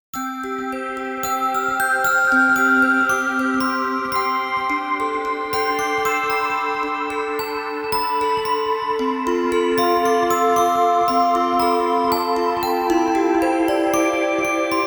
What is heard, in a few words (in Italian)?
Campanelle Natalizie